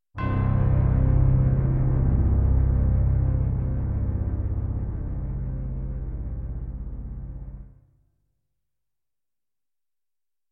Intro, Roland Edirol SD-20 GS MODE; Dark Forces

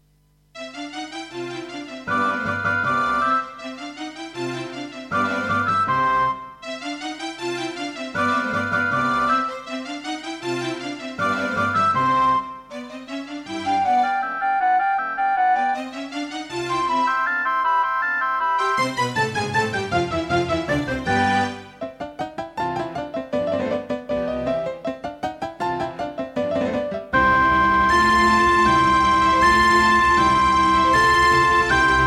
Yamaha DB51XG GS MODE; classical; Mozart; Concerto K467 partial

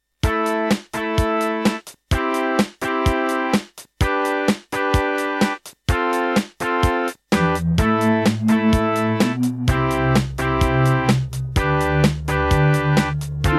Diamond Monster Sound 0.5MB; raptor call of the shadows

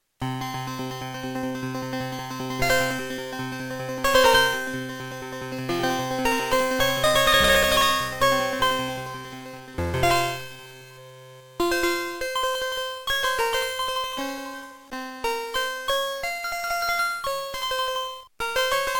Intro, Beepblaster 2.0 BEEPMODE; Prince of Persia 2 - Intro

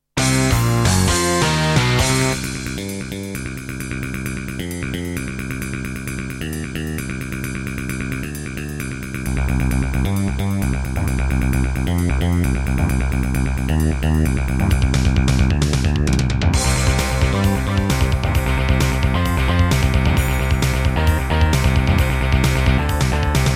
Hammond GM-1000; sigil doom episode 5

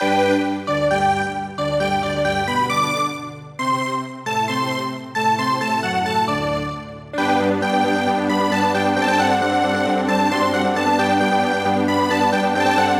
Alesis Harmony 32; classical; Mozart; Eine Kleine Nachtmusik partial

